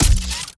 Grenade Launcher Fire Heavy

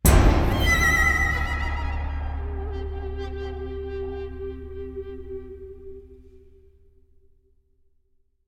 Player Death

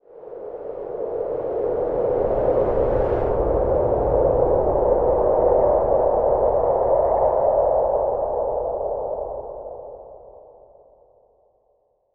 wind gust eerie haunting; a dump in the dark

